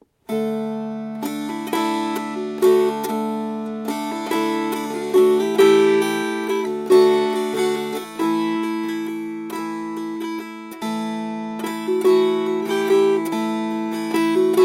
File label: Strummed